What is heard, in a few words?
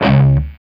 D 3, Soldano rock guitar